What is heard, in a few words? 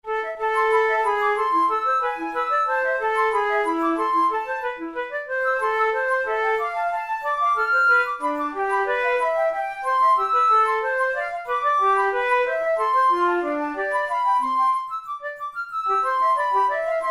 in a minor, flute